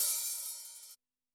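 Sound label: HH OPEN, Yamaha SY85 Drums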